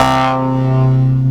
SYN, Drum & Bass, 130 BPM; Ueberschall; Drum & Bass Flash Trackz

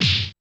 Korg 01W Dance Kit; 300 Drum Machines

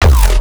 E Bass Hit; neuro; BIG5AUDIO

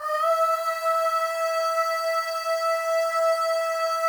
AHS, FEM; Spectrasonics; FEM.1 AHS

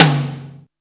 TOM DRY; Back In Time Records; Big Bang

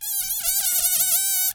Balloon, cartoon Foley Fx; musicradar; cartoon caper

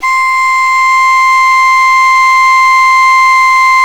SULING C4; Exotic Winds

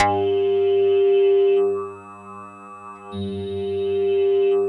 AQUAMARIMBA G1, Yamaha VP-1; Music Store; 90's Sample CDs

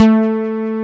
DIGITAL BASS, BassGuitar

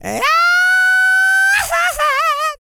YELL, MALE HUMAN; Spectrasonics Vocal Planet

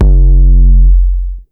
DUTCH KICK; Masterbits; Soniq Elements

